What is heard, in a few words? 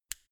mini switch turn on hard